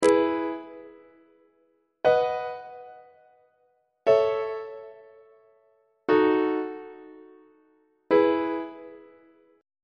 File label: e major leading tone iac on treble clef